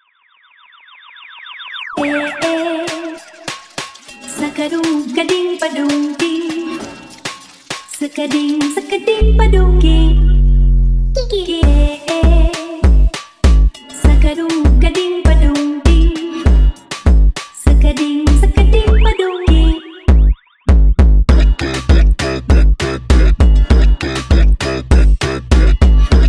Automotivo super Slowed

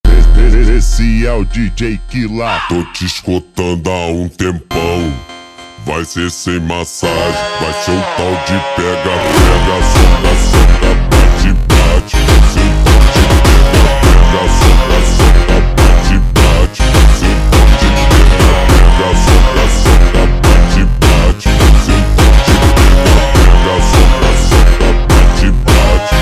super Slowed, فانک

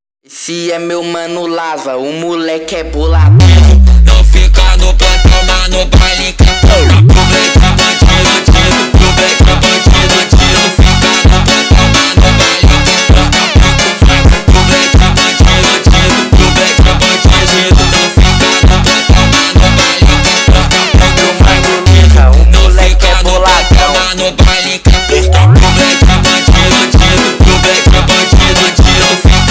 Funk, funk

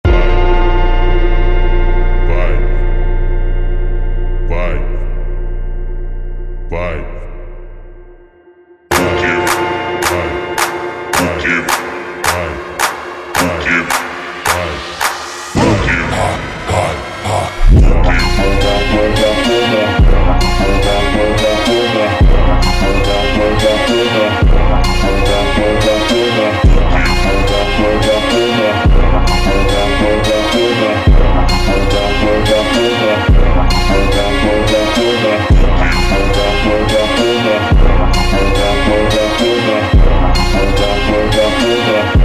slowed Reverb, funk

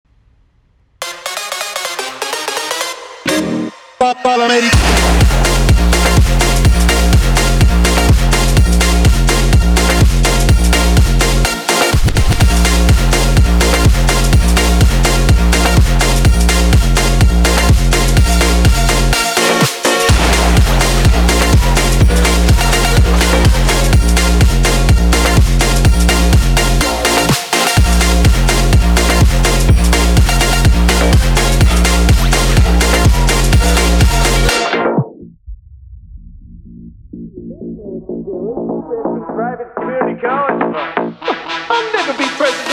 phonk Version

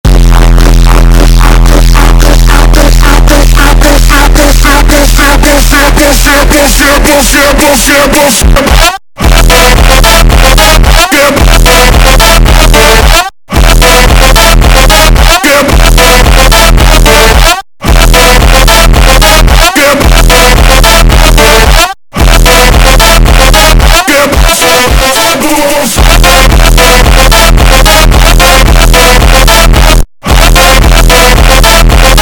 extreme Slowed, funk

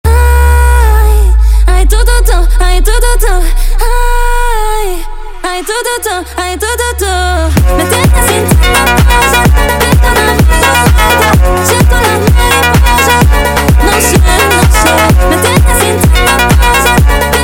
sped Up, فانک; funk

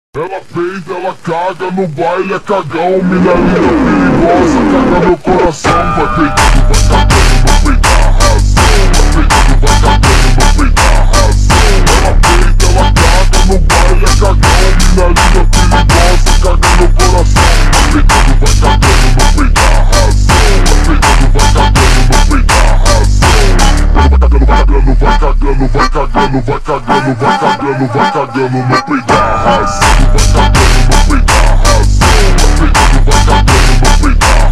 Tekk mega Slowed; funk

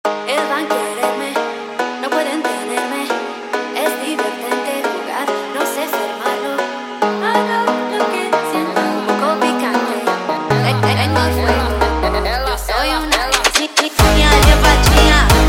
Funk sped Up

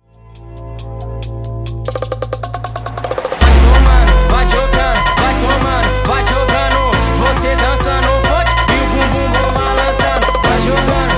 Funk Sped Up

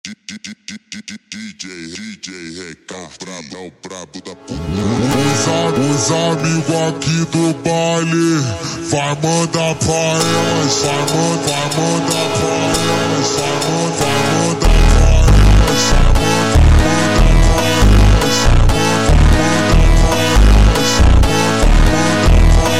ultra Slowed, funk

